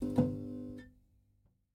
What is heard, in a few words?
Game Automate Screen On; MiSide